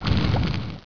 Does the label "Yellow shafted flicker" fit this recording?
No